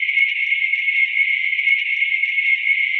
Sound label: skid, karts; sara the racer; stk